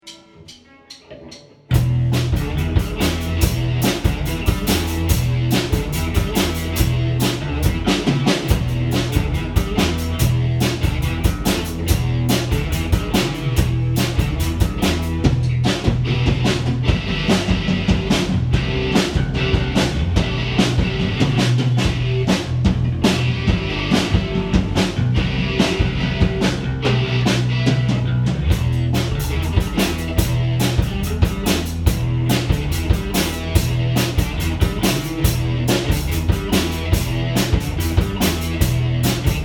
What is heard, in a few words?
Riff Rock; rockbandacademy